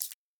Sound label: card touch